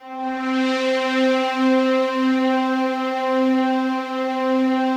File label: big slow pad C4, Yamaha VP-1; Music Store; 90's Sample CDs